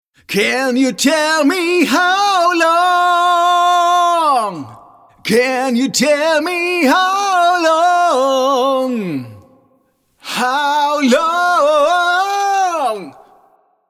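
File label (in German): Vocals Niere Nachbearbeitet EQ Comp Reverb Bright Hall; Lewitt; L1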